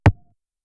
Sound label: strike